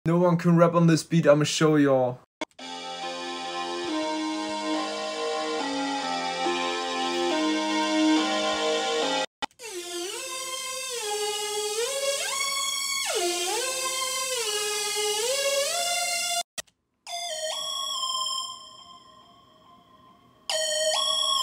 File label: slowed bass bosted music